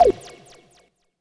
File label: END, Tekno Industrial; East West